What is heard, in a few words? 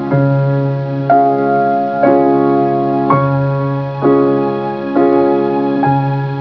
Piano